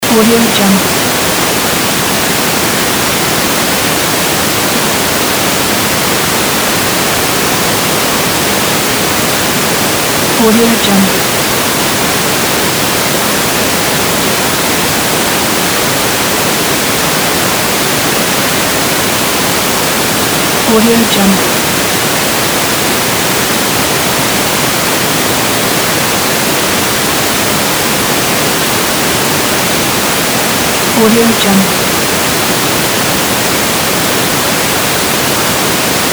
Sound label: tv noise